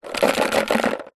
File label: sport ping pong balls in plastic tub shake; zapsplat